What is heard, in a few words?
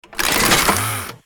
Octobot Attack